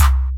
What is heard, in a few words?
Sub and Kick